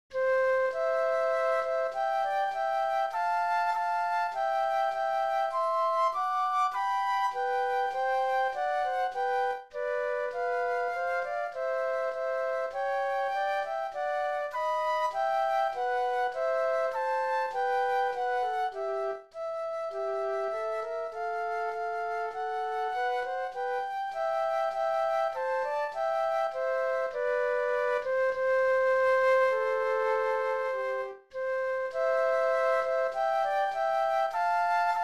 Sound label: Flute Duet